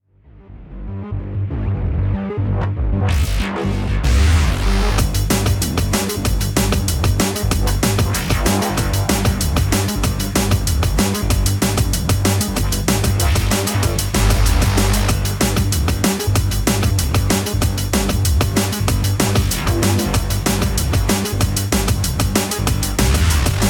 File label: 190bpm; terrorhythm trrt